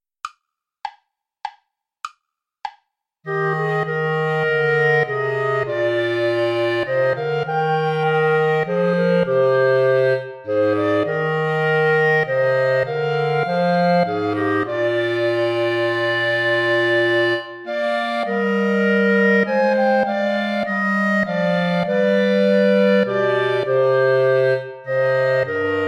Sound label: play along without clarinet